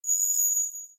chariot Creak

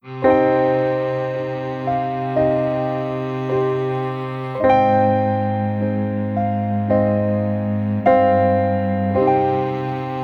Sad Piano

